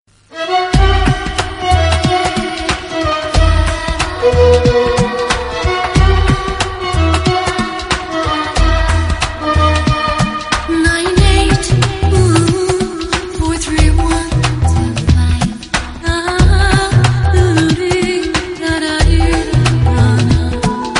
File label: idea mobile theme music ringtone